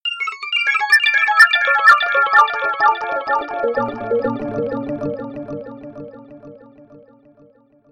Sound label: soft arpeggio